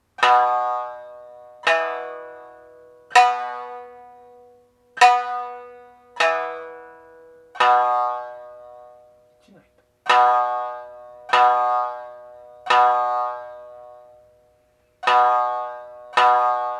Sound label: tuning